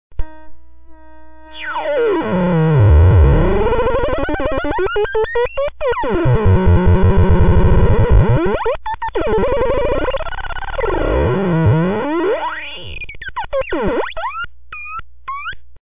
theremin

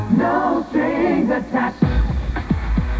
no strings

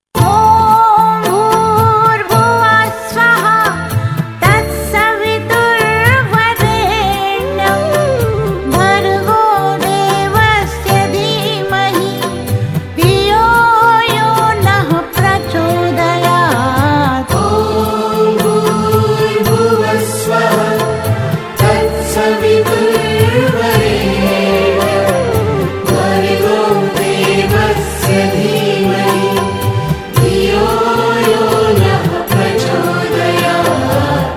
gayatri mantra caller tune

